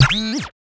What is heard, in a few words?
robotic arm buzz